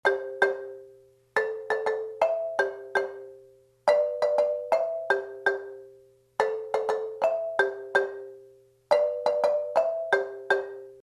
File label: partial chords, marimba